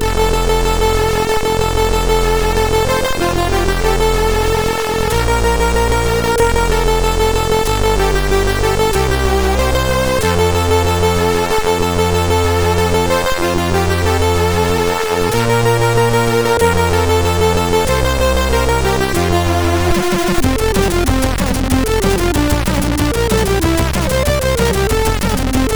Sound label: Afrika Intro, MOS6581R3